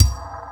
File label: BD, Industrial; BD KIT; Industrial Loops